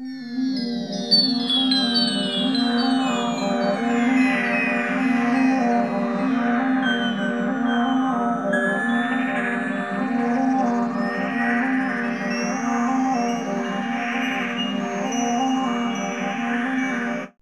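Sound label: sequence atmos; AMG - Vince Clarke Lucky Bastard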